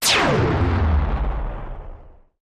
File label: Big Gritty Zap Blast